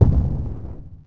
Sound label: Foot, Aliens